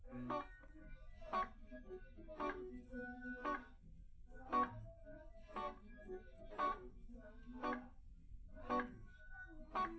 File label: nodrums; SiSEC11; underdetermined